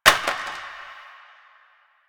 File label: Plastic Drop; Warframe; NineteenNinetyNine